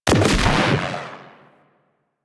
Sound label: Space Fighter Dredger Jelly Rocket Fire; Rail Jack; Enemy Ships; Grineer; Agent